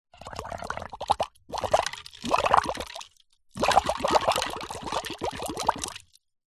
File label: blowing bubbles through straw into cola ice plastic cup